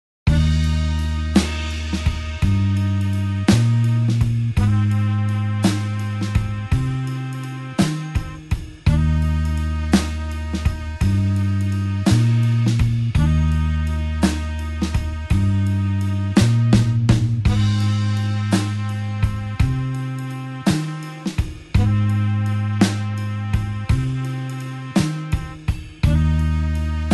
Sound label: blues horns slow Eb